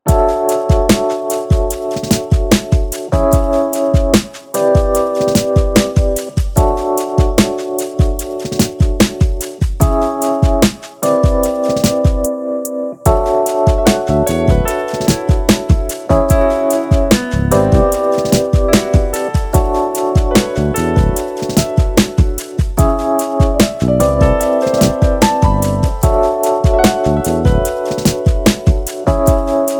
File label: Chill After Rain BPM 74